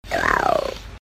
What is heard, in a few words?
Catfish snarl